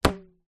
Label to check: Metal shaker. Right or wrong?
Wrong